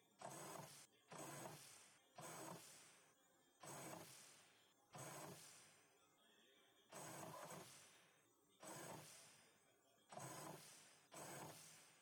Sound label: Printers